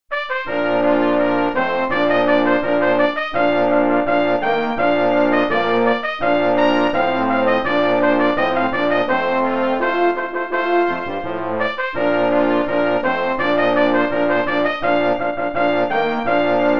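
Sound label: Rondeau, Trumpets, Horn, Baritone, Trombone; brass quintet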